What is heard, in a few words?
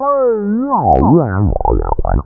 Silly synth; Fanfare; 1997 MMX LAN PACK (win 95); Worms Armageddon